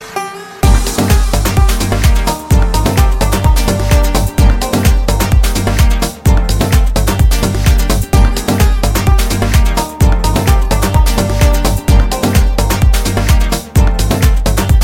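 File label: Guitarra Electro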